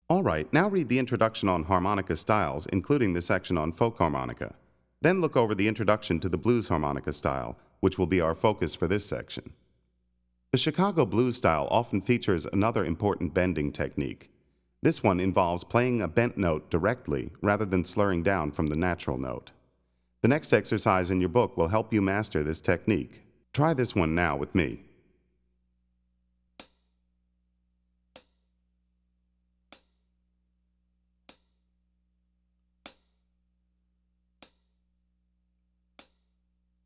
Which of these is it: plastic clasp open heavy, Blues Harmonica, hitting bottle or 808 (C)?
Blues Harmonica